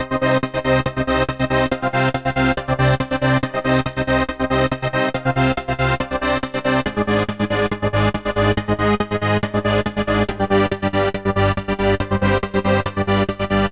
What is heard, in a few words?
Trance Voice; straightliner